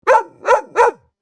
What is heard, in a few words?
dog